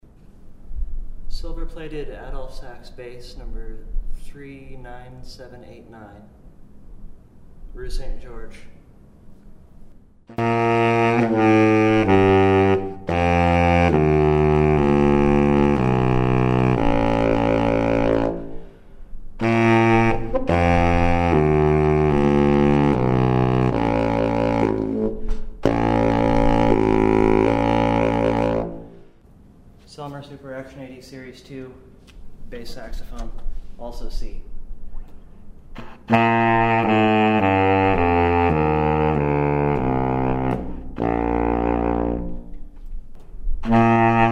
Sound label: Sax Bass Shrine